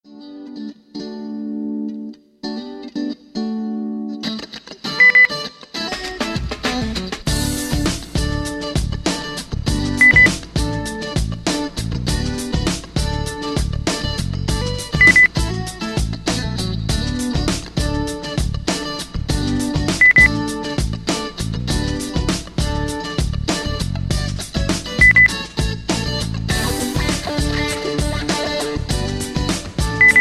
rock

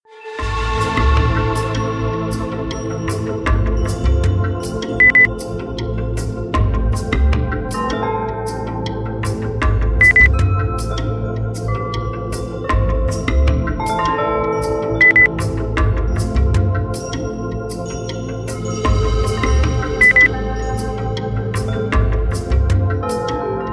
percussion kalimantan beep

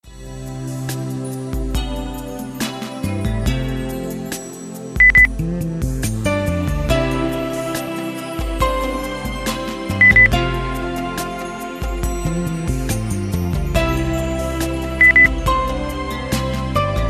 soft

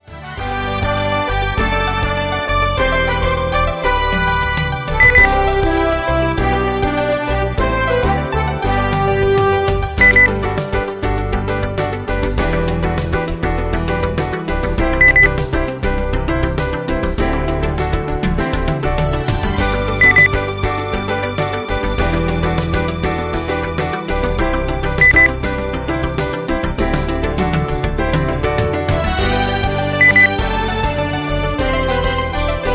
pop

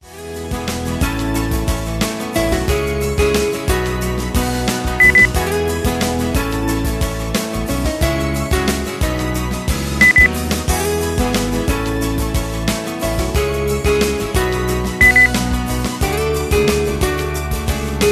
pop

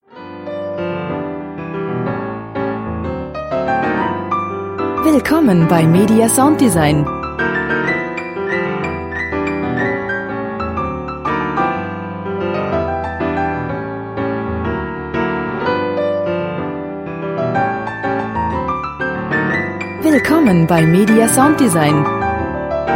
jazz

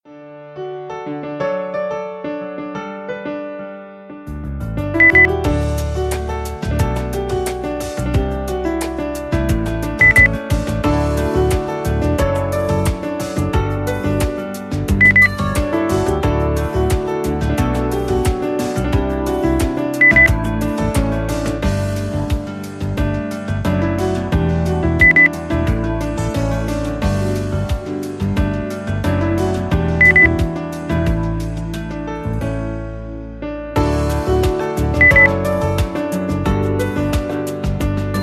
soft

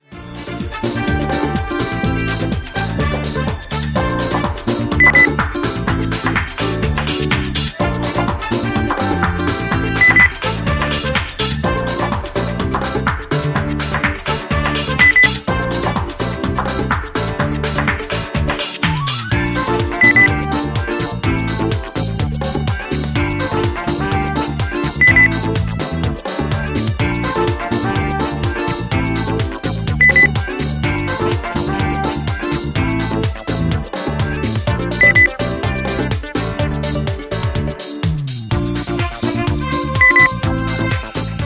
urlaub brazilian heat

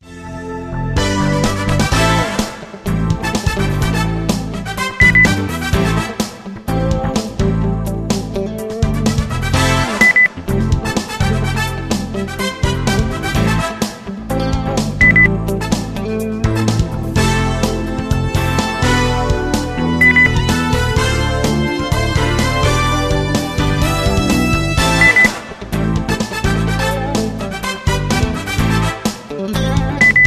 groovy

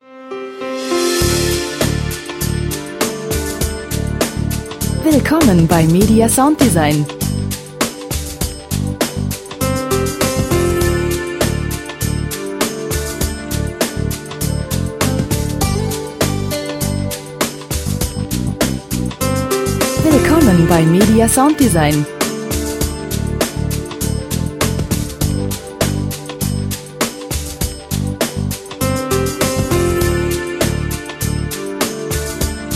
percussion

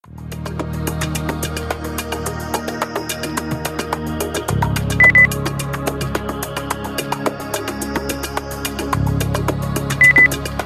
percussion

